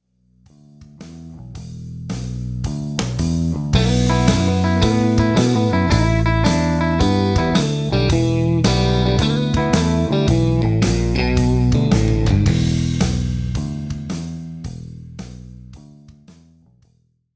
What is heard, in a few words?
Triplet-based Turnaround Line, blues, guitar; guitarist presents blues